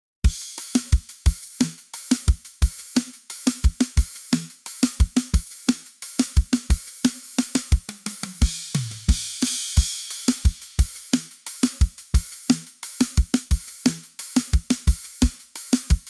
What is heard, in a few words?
Drums